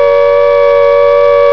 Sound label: ALL CLEAR; ALARMS